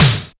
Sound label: sharp acoustic snare D key; snare drum samples